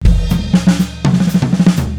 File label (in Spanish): Loops mutantes